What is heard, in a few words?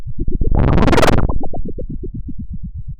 pop, Sound of a thunderstorm